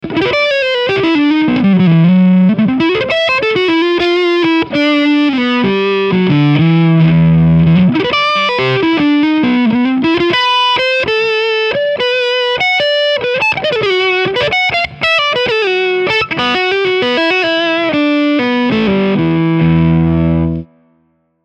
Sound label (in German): Lick beide SC Overdrive